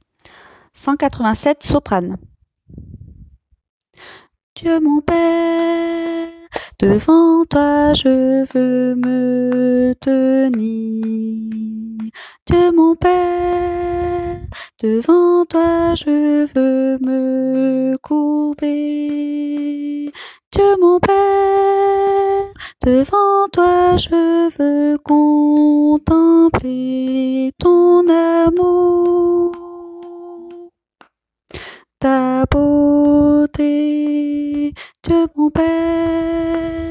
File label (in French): soprano